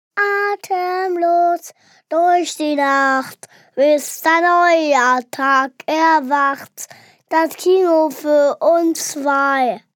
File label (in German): Gesang